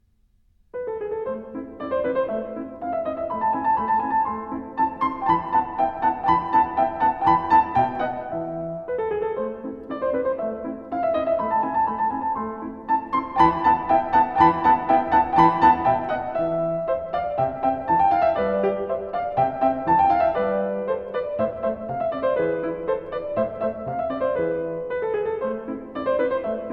piano sonata no. 11 in a major alla turca allegretto